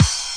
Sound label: kick crash; Punches Hits Discoblasts; single hits